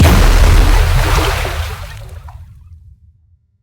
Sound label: Explosion hit water; Hovl Studio; SFX Battle sounds; WhiteMan Unity2D